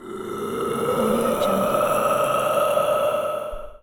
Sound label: horror monster roar